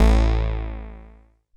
JUNO BASS

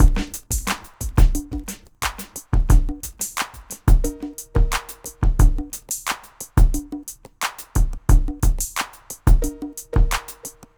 Drums, Hip Hop

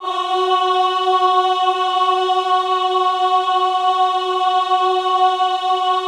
AHH F3, choir; Best Service; Extended Classical Choir; AHH MIX

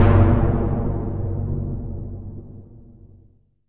PROPHE FX, SYNTH FX; AKAI S-Series CD-ROM Sound Library VOL-6

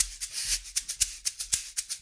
Shaker Scrape, 70s Analog Funk; Sonic Foundry (Sony Creative Software); What it Is!; Good Times

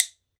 WOOD BONE, Celtic Percussion

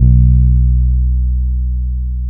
B 2, hood boom, bass; Spectrasonics; Bass Legends CD 1

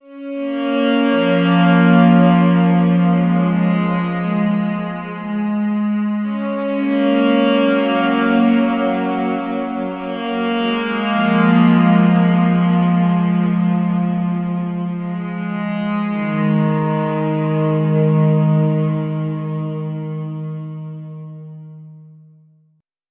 Sax; Sonic Foundry (Sony Creative Software); Slow Silhouette

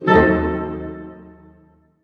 HARP HITS D# DM, Orchestra; Sound On Sound - Peter Siedleczek's Orchestra

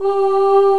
CHOIR G4; VOCALS; Greytsounds VOL-1

